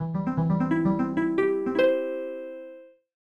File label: Spell Lifted